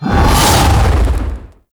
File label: Golem Melee Swing; Warframe